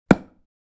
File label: Dartboard impact dart hit; Darts